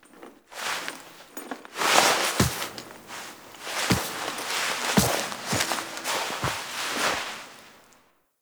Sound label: Epilogue Foley; Zariman; Cinematics